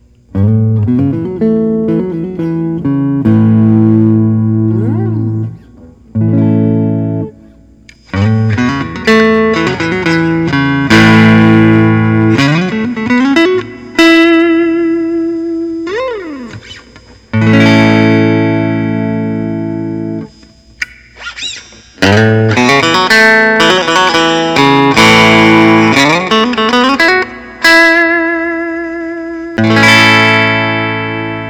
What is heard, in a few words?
fender telecaster blonde